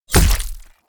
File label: Spear impact body hit gore blood